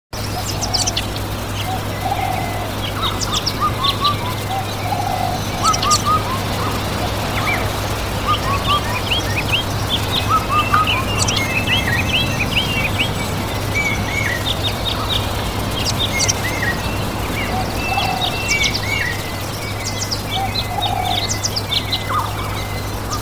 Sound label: summer afternoon in countryside; sleep